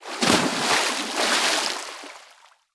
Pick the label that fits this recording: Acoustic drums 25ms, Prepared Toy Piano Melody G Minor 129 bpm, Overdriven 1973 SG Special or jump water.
jump water